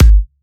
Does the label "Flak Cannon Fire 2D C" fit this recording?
No